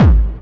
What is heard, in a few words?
hardbassdrumz, breakcore; Bazzdrumz